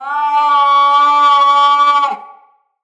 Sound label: Snow Critter Player Lure Call; Venus Animal Lure